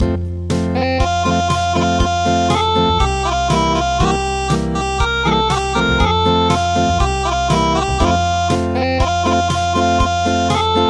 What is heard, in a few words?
Acoustic; Morning tide